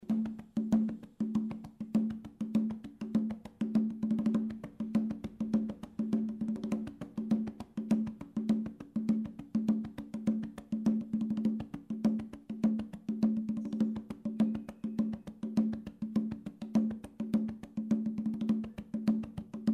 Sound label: congo laye sugo